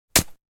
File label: wood crack